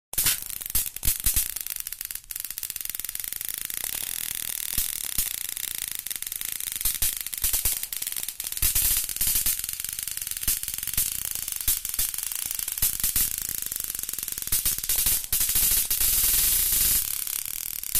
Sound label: Bug Zapper Sound Effects